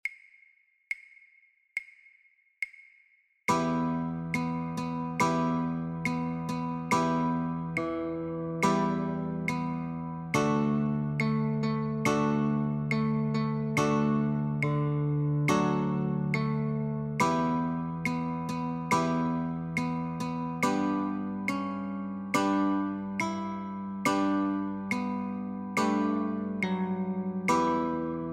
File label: Notes and Chords, Guitar